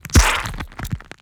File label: creature crab organic texture carapace crack squishy; Bluezone Corporation